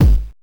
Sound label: Kick, Genesis; Streets of Rage 3; M8